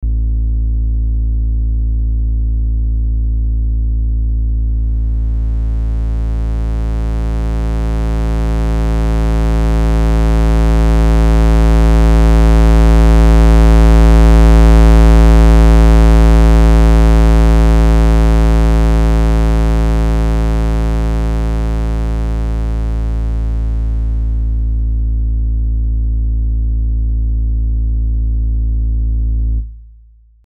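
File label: Buchla Easel V Complex Oscillator Pure then Square No Timbre Mod; Arturia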